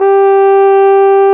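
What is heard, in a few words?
G4, mute trumpet; AKAI S-Series CD-ROM Sound Library VOL-2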